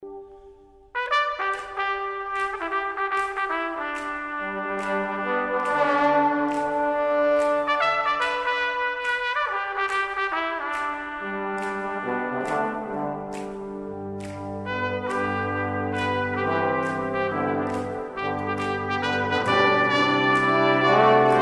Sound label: swing low, brass